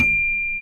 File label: Celeste D5